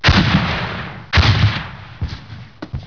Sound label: grenade launcher fire twice